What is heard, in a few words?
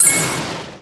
combo; match3